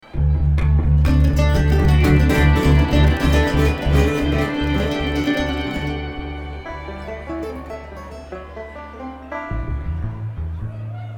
Traffic Jam

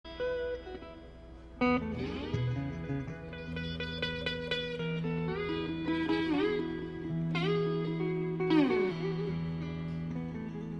Tuning Jam

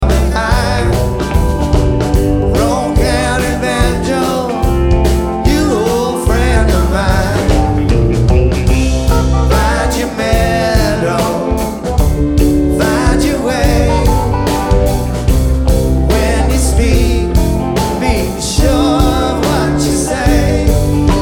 Banjo

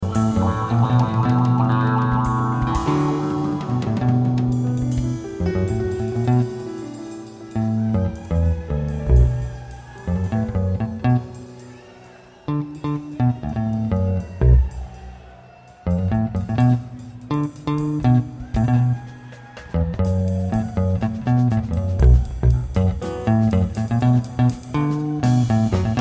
Drums Bass

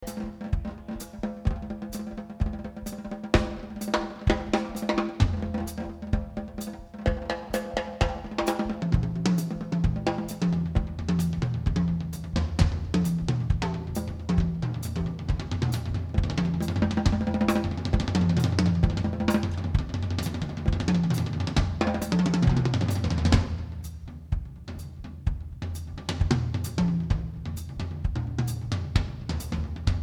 Drums

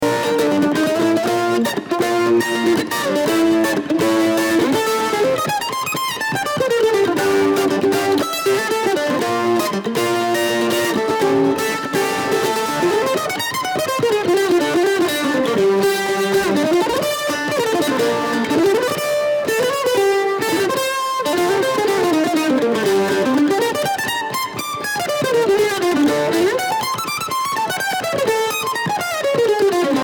Kentucky Mandolin

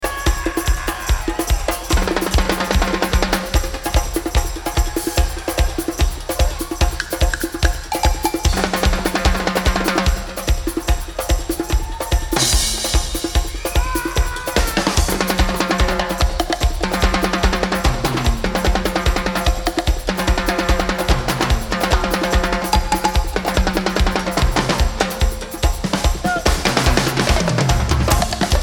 Drums

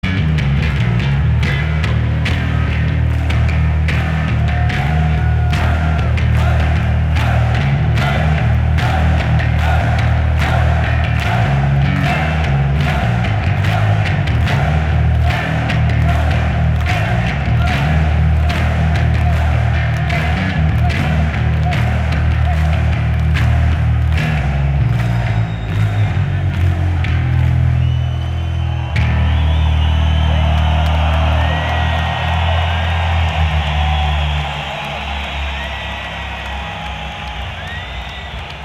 Bass Solo

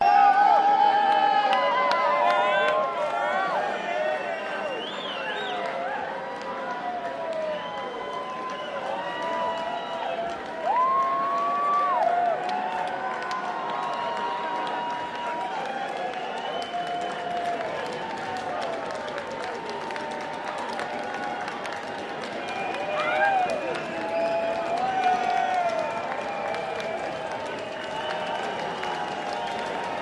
Crowd

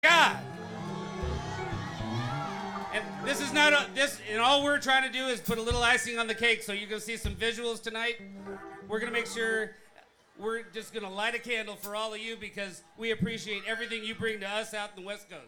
Tuning and Introduction

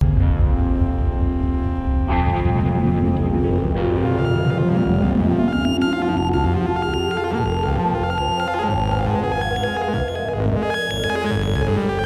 EWI 4000

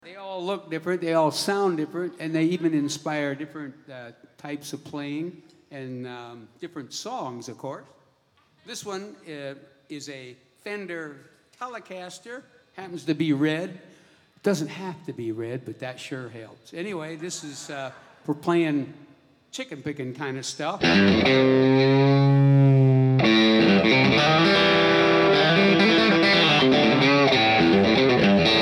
Guitar Moment

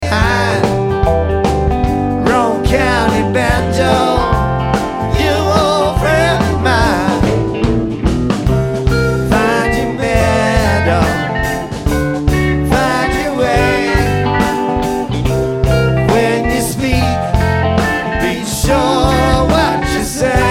Banjo

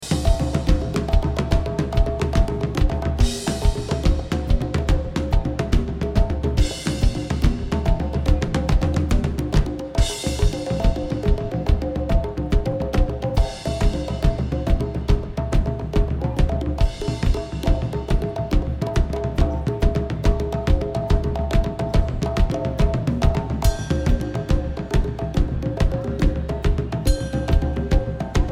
Drums

